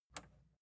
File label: record player power release on